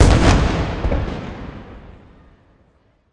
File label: Music Frontend